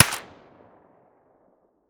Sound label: svd silencer mono distant